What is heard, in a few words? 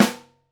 Overhead, Snare; Ableton Foundation FreePacks; Snare Designer